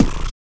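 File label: vox percussion, Vocal Planet Jazz FX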